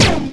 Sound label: PULSE; lander